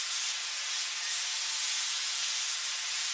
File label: loop steam